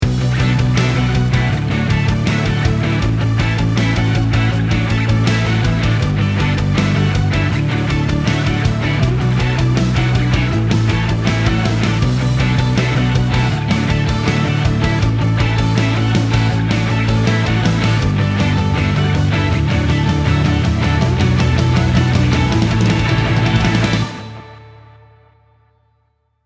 Bridge Sample, Synth Pop Punk - Drumless Backing Tracks